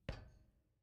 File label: Player Footsteps On Metal Crate Step; Main Character